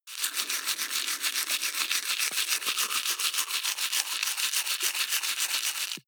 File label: teeth brush wet open front circulate